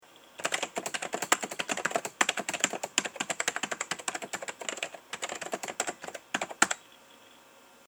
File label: alps switch